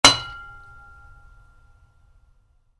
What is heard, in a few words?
Wood hit metal ring, Wood, Light Impact: Metal, Rings Out; Materials; Stuart Duffield